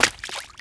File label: wade; action-quake2